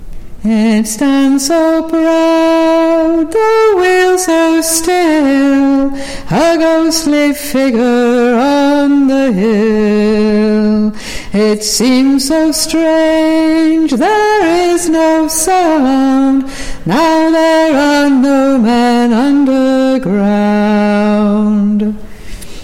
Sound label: Coal Not Dole Tune